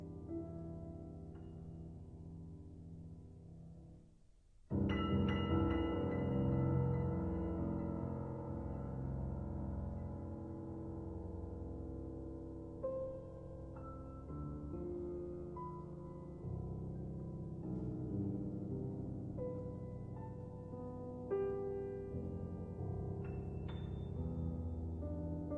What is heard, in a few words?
Prelude in C major; 6 Preludes and Fugues; Prelude No 1